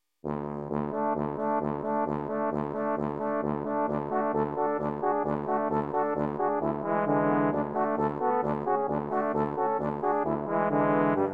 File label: Romanian Folk Dance